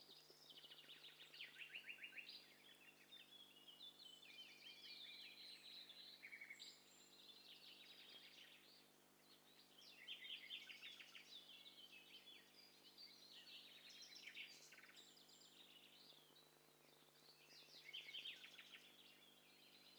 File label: STEREO BIRD COCKTAIL PARTY